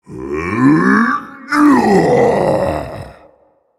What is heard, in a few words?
Vocal Colossus Efforts Heavy